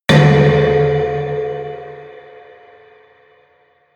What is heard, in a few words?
metal boom, metall; hits explosionen